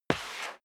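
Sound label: step sneaker concrete slide long, footsteps; schritte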